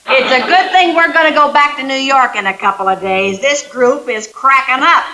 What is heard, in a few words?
cracknup